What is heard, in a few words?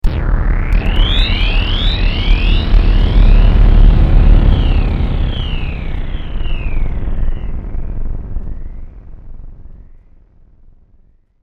Synth Stab Danger, Synth, Stab, Danger, Ghostly, Horror